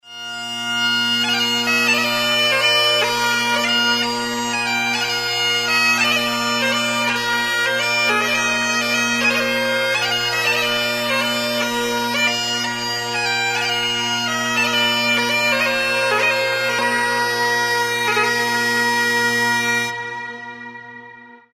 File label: BAGPIPE Sound